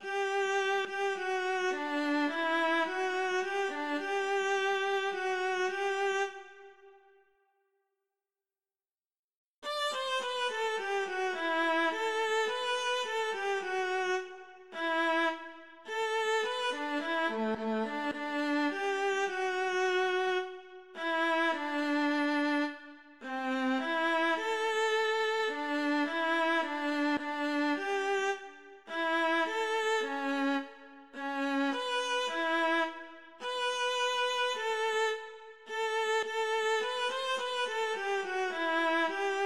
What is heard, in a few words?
viola; HWV259